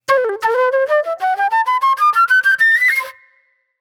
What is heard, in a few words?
Flute